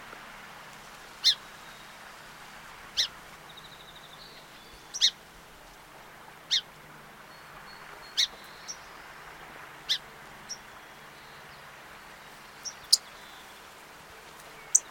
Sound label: Song Sparrow Melospiza melodia; sparrows